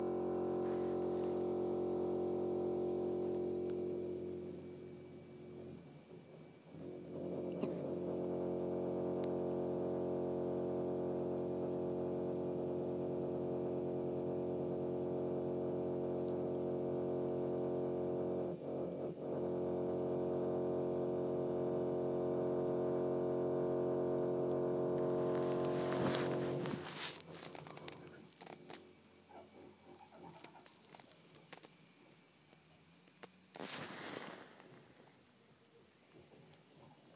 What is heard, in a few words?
drills